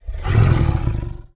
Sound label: MONSTER Growl Deep Medium; MONSTERS & CREATURES; mono; Universal Sound FX